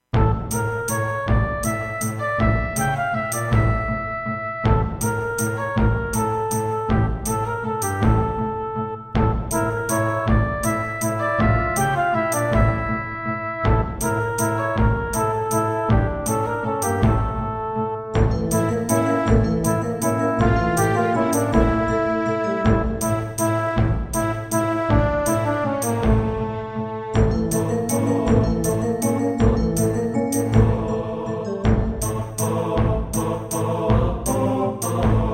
Roland SCB-55

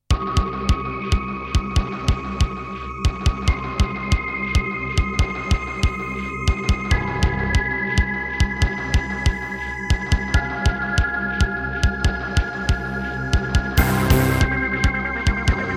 Roland SC-33; sigil doom episode 5

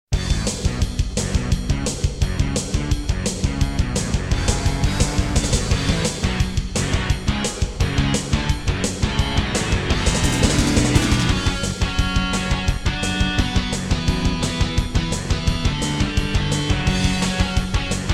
Intro, Akai SG01k; Duke Nukem 3D - Intro

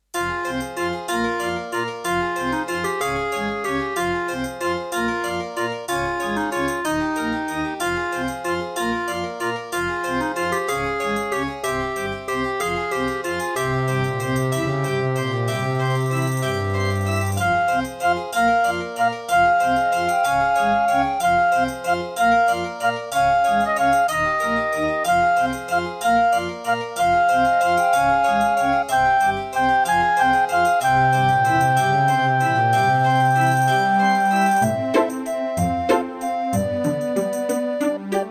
Track 6, Roland SC-88 Pro 55 MAP; Death Gate

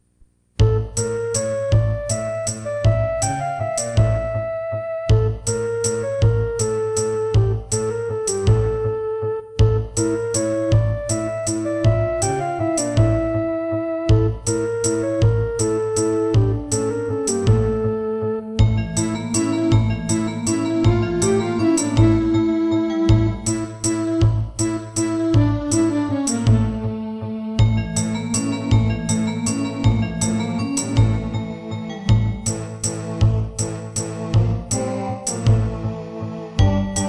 Serdaco E-Wave

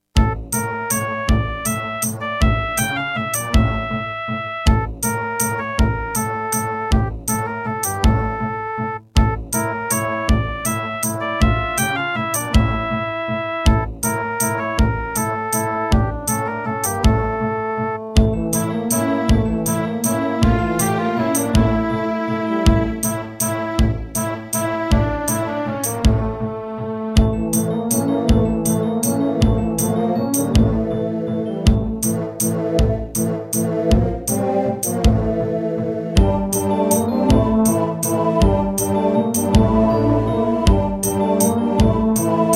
Terratec MiniWaveSystem TTWT

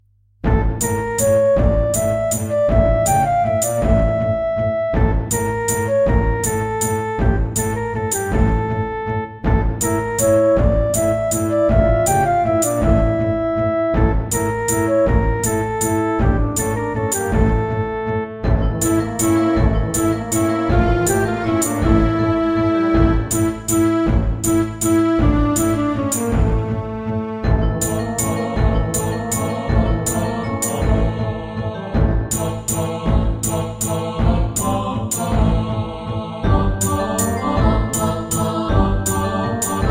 Yamaha DB50XG GSMODE

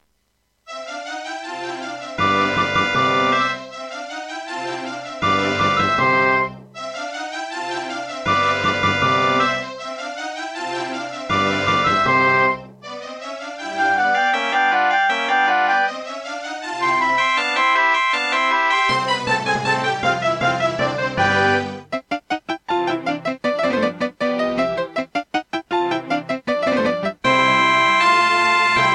Concerto partial, Serdaco Qwave; classical; Mozart; Concerto K467 partial

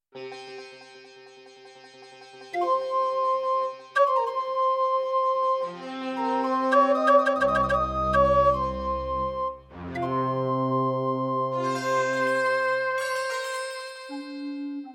Intro, Kawai GMega; Prince of Persia 2 - Intro

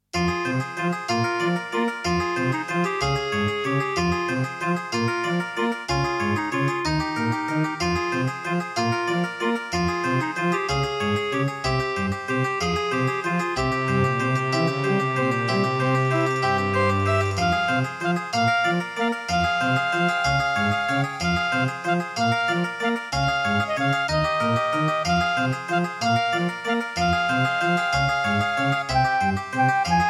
Track 6, Casio CTK-2100; Death Gate

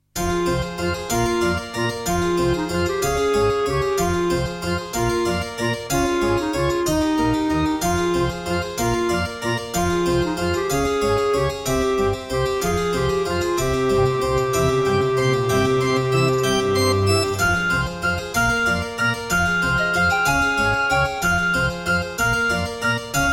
Terratec Wave XTable; Death Gate - Track 6

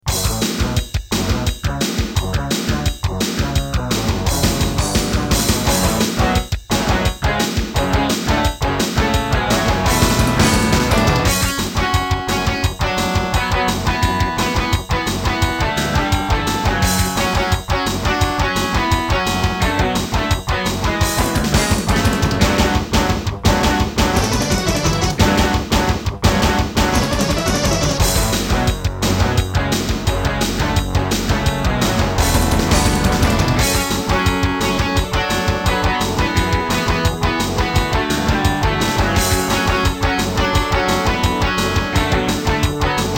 Creative Midi Blaster MB10; Duke Nukem 3D

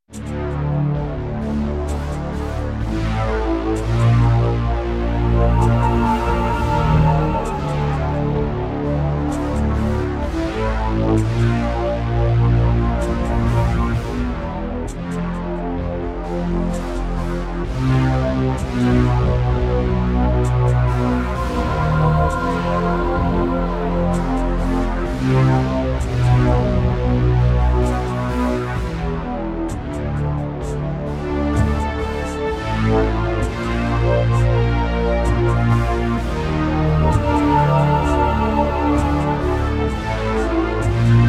Roland SD-90 GM2MODE; Descent II

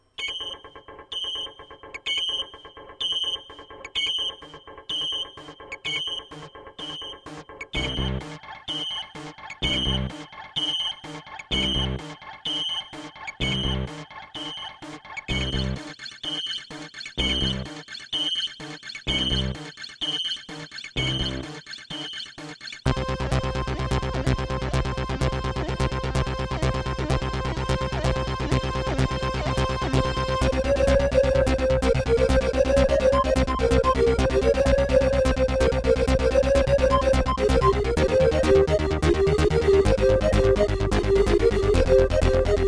Roland SCB-55; System Shock

